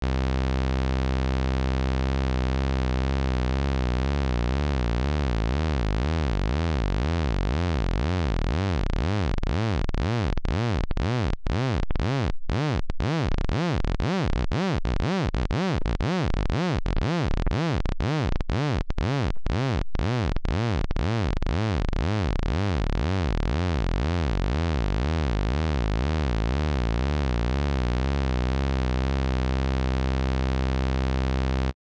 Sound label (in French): LFO Depth de 0 à 100 puis retour à 0